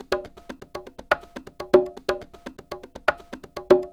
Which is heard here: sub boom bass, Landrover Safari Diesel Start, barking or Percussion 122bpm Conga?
Percussion 122bpm Conga